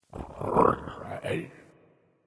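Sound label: Movement Purr; smite; god bakasura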